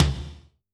Tom, drum

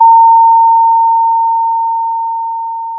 Sound of a school bell

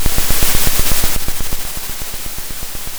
pop, Sound of a drum roll

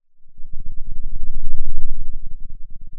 pop, Sound of a thunderstorm

